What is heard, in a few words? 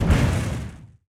damage hits explosions, Warships